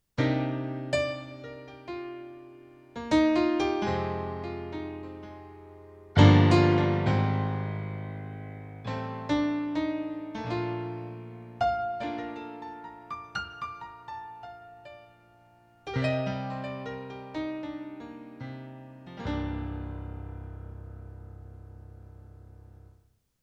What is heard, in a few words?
Piano